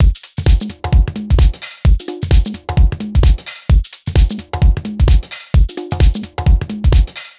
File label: Full Loop 125, Loungin House; Equipped Music